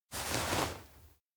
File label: grip hand; anemoi